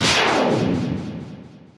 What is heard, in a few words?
release shoot; deltarune